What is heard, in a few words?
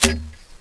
law unlatch, weapons